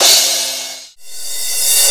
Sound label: Crash, Electro Shock